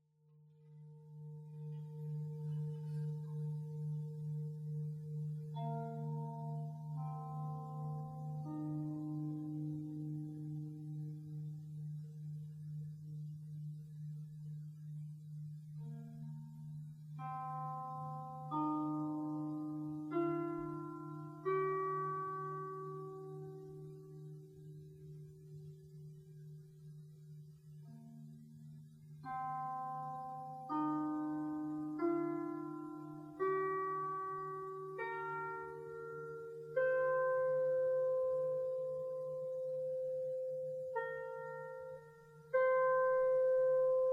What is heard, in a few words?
Gongs and Percussion